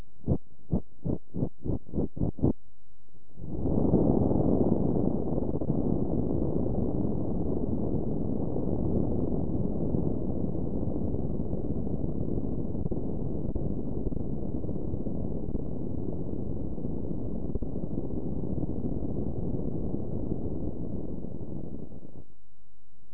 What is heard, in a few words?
emg fist clench, Muscle Sensor; Sound recording (WAV format) of a fist being clenched (8 short pulses followed by 1 long contraction)